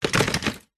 office chair set down tiled floor

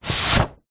paper sheet a4 pull slide out from under another; foley

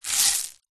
small stones scoop up from paving stone

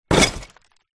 household garbage can impact

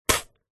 human spit; zapsplat

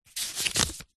household book minature page turn; zapsplat

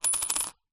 foley money coin set down on tiled table

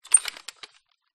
household led lantern lamp handle movements

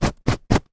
clothes brush brushing jumper; household; zapsplat

